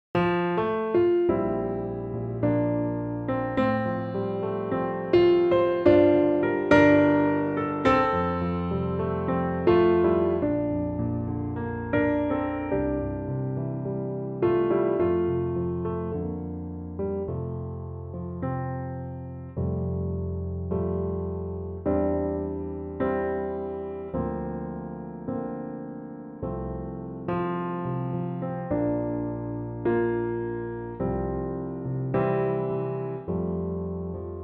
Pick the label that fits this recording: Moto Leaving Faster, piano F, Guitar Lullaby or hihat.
piano F